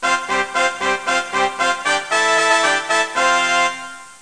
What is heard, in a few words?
fanfare